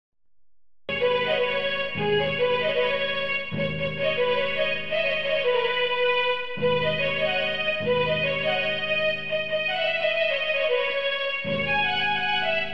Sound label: odia song piano